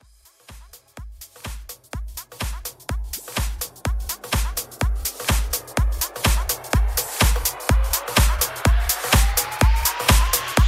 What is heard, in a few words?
Bass House 125 BPM